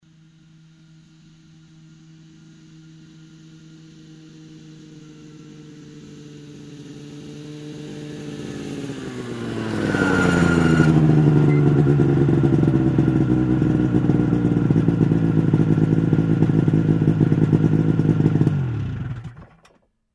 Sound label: Snowmobile is approaching, waiting, muted